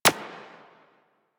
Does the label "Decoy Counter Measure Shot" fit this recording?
Yes